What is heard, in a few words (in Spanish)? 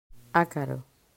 acaro